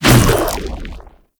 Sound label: Shadow Mace Whoosh; Warframe; Weapons; Shadowgrapher